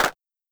Simmons Clap

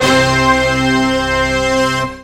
D50 SWLL BRASS; East West; Global Sounds

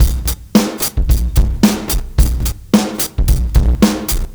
R&B Drums; Sonic Foundry (Sony Creative Software); Live n Ruff

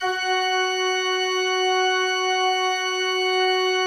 F3, Cathedral Organ; ILIO; Propeller Islands Cathedral Organ

